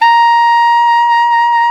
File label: SAX ALTO MP; Roland LCDP07 Super Sax